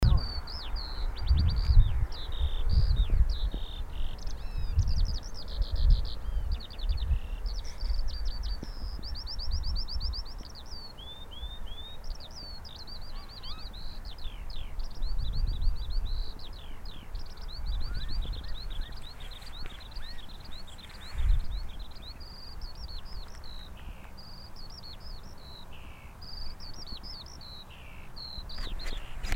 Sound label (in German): Feldlerche Gesang